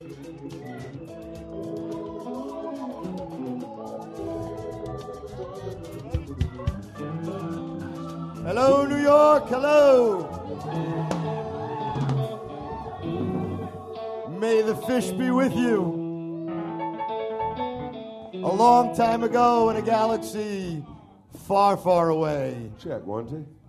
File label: tuning